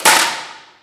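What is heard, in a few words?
physics clipboard impact large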